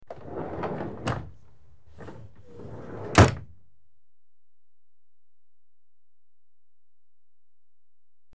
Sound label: Carriage Interior Doors Closed; BBC Sound Effects Library; Age Of Steam